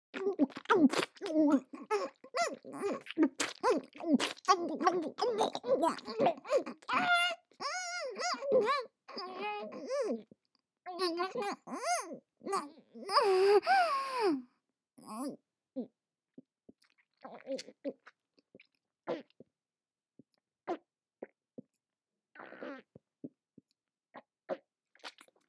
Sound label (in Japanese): イラマチオからのえぐいゲップ